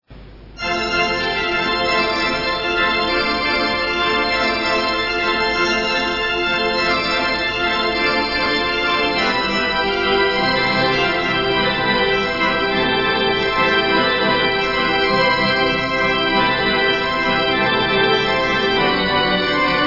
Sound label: Toccata, orgel; Bavel; Widor